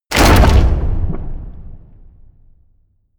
hit wood, hits impacts

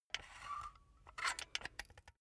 silicone gun empty pump slow; soundpool

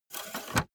car bmw e46 exterior oil rod pull out fast

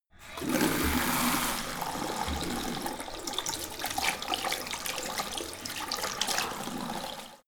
sink medium flow wash; haus garten; badezimmer

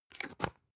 spice jar big scratch close

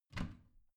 bathroom cabinet warped open regular; objekte; tueren

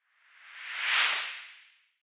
whoosh simple long high; swooshes-swishes

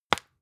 spice jar close

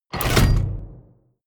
sci-fi contact mine place

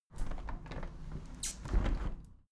window handle plastic squeak close tilt soft; objekte; fenster

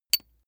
small metal impact medium high damped; industrie wirtschaft; metall

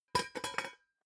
pot lid close plop short; objekte; topf

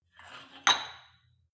weight rack insert weight medium, fitnessstudio

